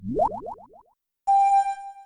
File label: Yawn; pokerogue; battle anims